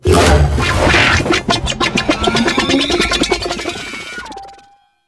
Space Fighter Plasma Charge; Corpus; Space Fighter Plasma Agent; Weapons; Warframe; Vehicles; RailJack; RailJack Enemy Ships

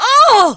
Pain, Barks; Kuva Lich; Persona B

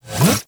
Bullet Jump Pre Land, Motorcycle; Warframe; Motorcycle Ninety Nine

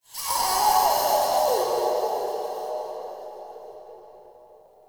Interior Texture High Vain Squeeze; Ambience; Indoor; Warframe; Infested Eris

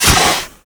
Fire Extinguisher Start; Rail Jack

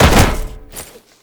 Land Heavy Perforated Metal, movement; Warframe; Uriel

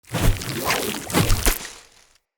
sludge whip; Enemy; Combat; Characters; Corrupted Sproutfolk

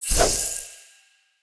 Loot Drop Generic

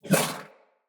Wyld Blind Shot; Fey; Saboteur

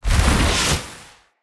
Stone Cast; Fey; Ward

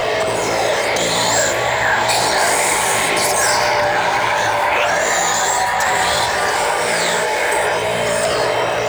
Scanner Dome Loop; Entrati; Swarm

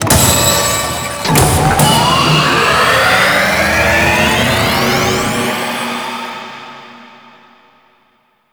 Orokin Sabotage Console Activate; Warframe; Ambience; Oro Sabotage Objective

